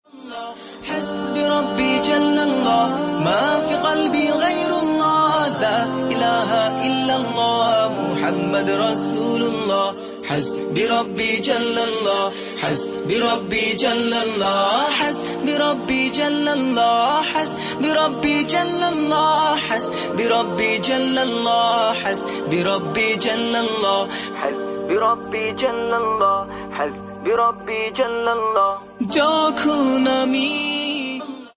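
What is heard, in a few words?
Hasbi Rabi Islamic Ringtone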